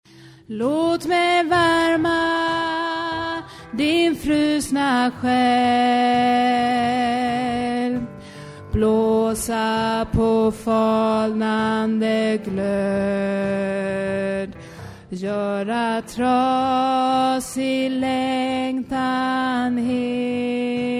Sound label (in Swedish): alt, stammor